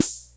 KORG SR120; Drums

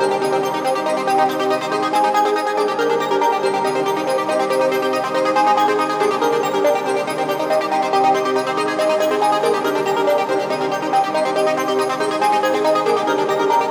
140BPM C; Vengeance - Essential Clubsounds Vol.4

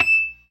PERC, ORGAN; Keith Emerson Signature Series

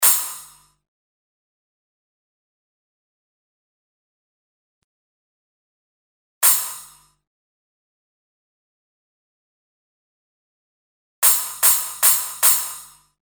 Splash, Cymbals; Industrial

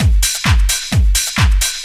drum 130 groove; Techno Trance Essentials

